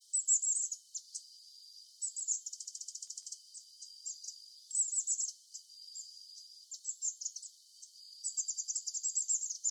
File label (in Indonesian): cerecet jawa